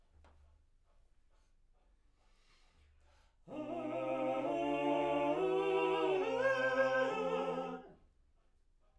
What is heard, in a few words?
Backs; SCHOEPS